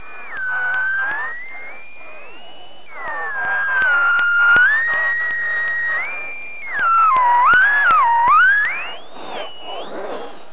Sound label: electronics